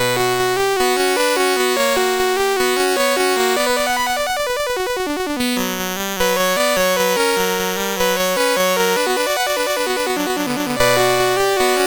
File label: Invention in B Minor, MOS6581R2